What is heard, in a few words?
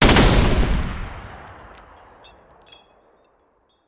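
Artillery Cannon Anti Aircraft; Sound Ideas; Guns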